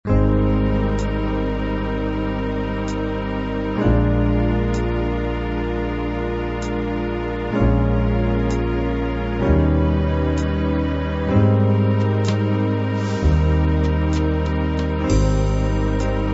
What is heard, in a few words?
jazz ballad